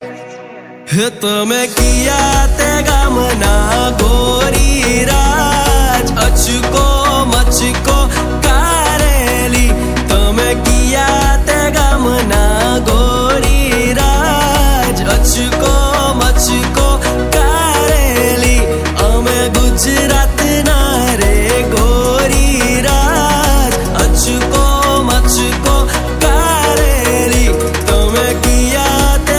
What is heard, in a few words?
gujarati ringtone